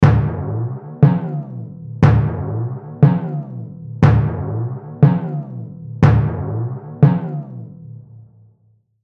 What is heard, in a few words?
Timpani Bends, Timpani Bends Rhythmic; Cartoon; Cartoon Music; Cartoons; Blastwave